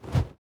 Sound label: Devilsorcerer Move; Karda Mahal Underground Temple